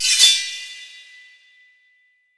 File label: Skill Sword; Berserk